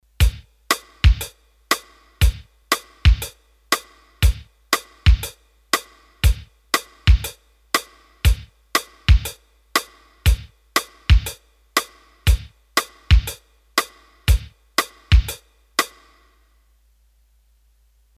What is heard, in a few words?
Reggae